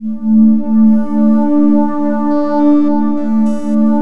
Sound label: AMBI PAD